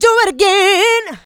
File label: mixed vocals; Houseworx